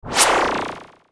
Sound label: gyrocopter pickup